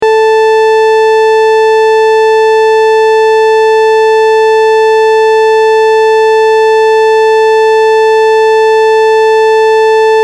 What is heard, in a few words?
Even Sawtooth